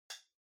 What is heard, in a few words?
Pendant Light Far Switch